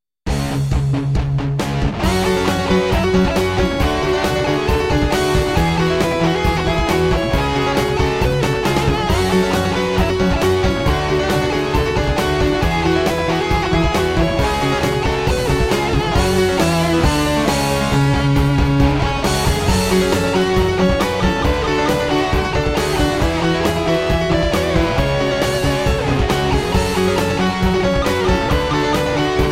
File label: Guitar mix